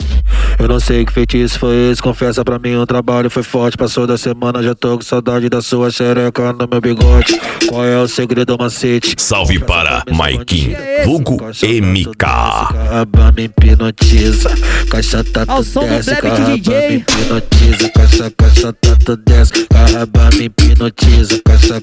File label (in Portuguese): funk sertanejo